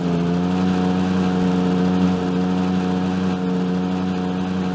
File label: kart engine; tf2ware ultimate; mariokart